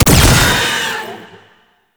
Fist Claw Spike Spawn; Weapons; Warframe; Tenno; Incarnon

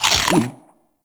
Burger Pick Up; Argon; Warframe; Nineteeen Ninety Nine; Deep Archimedia

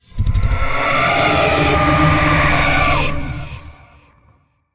Vocal Idle; Warframe; Zariman; Void Angel

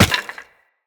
Run Bone, Footsteps; Characters; Operator

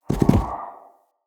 Stag Stampede Gallop

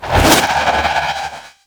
Melee Spin; Warframe; Sentients; Sentient Trooper

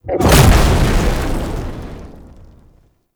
Summon Cast Impact; Roathe; Warframe; Tau; Abilities

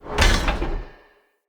Weapon Rack Open; Weapon Racks

